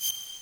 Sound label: menu; Metal Gear Solid 4; gamesoundopedia